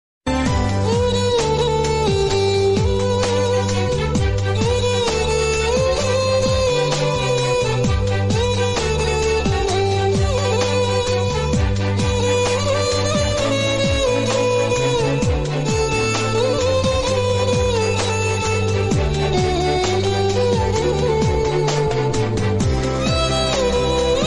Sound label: feeling song